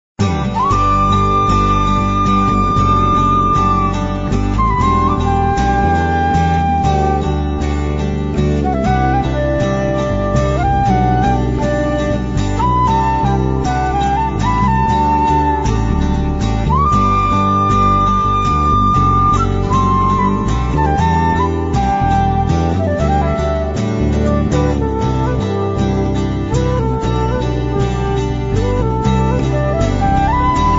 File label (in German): Feuervogel solo